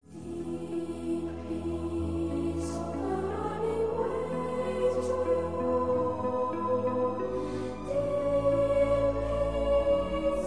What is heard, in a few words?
Choir; St Philips Choir